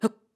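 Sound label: Climb, voice; Link; BOTW; Breath of the Wild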